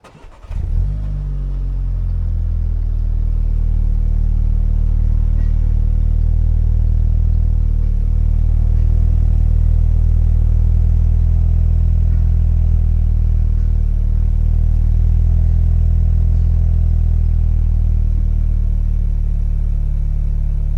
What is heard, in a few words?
Audi Q2 ONBOARD Turn On Start Long Idle Stop Shutoff EXHAUST CENTER; Dramatic Cat